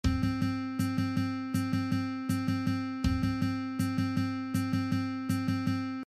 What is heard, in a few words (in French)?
Solfege